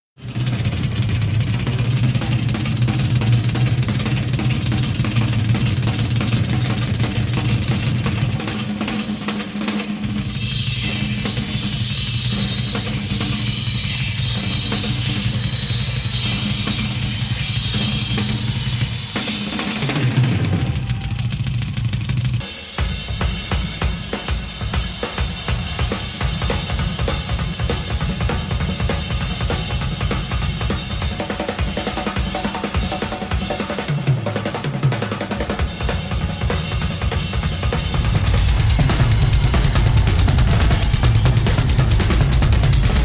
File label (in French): Batterie